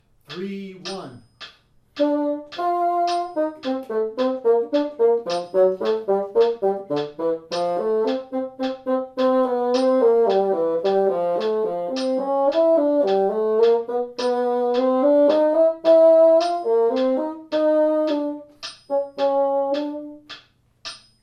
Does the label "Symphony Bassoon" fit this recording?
Yes